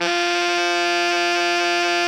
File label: ALTO F 3, Sax; 4-WAY